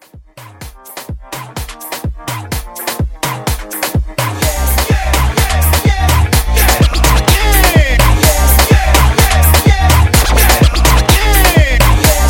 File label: Afro Hype 126